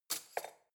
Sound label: cutlery spoon drawer place